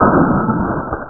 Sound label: splaten LOW; R3 the art of rocketry